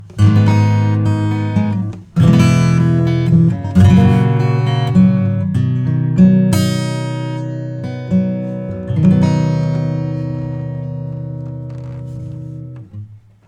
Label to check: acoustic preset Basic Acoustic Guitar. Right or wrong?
Right